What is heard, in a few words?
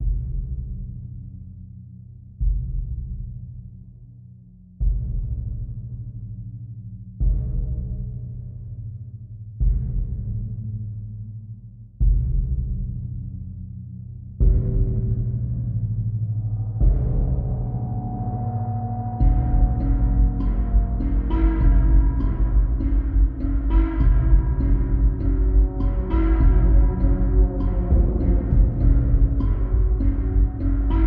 Drums Bass and SFX Only